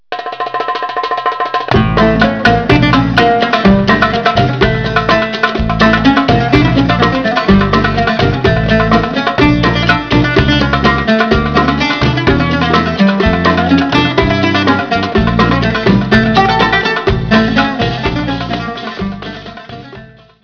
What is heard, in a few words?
dance, oud